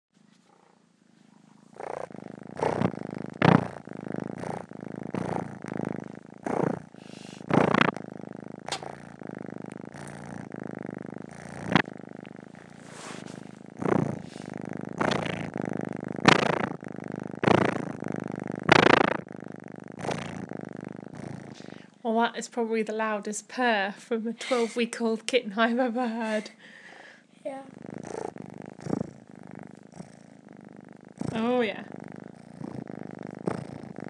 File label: the loudest kitten purr